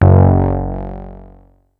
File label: Synth oneshot Long F#, Synth Bass; Synth Bass One Shots; Slate Digital BASSHOLE Sample Pack